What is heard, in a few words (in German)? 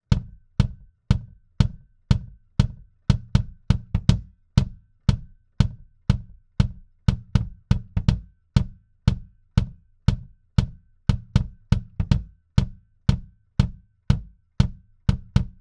Trident A Range BD ohne EQ